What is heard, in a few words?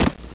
LAND THUD